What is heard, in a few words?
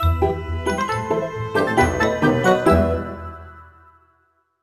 Minigame Win